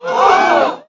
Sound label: crowd gasp